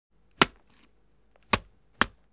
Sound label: Cutting pieces of meat with knife